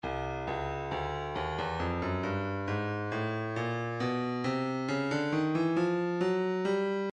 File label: breakbeat 068 bpm; 065 069 Bpm; Gentle Jim; Stylus Breakbeat Midi Files